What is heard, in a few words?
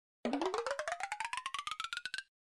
coin chain